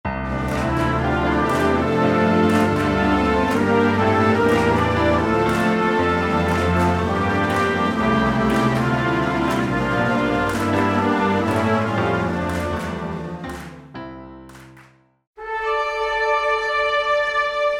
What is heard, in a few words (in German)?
Hymne